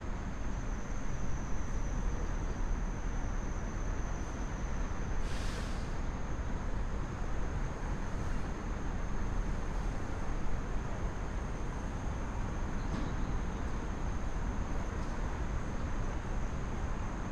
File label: SHANGHAI street night from 7th floor rooftop diffuse traffic rumble voices chinese; jackhammer horn; Sun-Smile-Island; Shanghai Ambiences